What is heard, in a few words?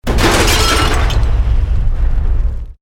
EXPLOSION MEDIUM METAL GLASS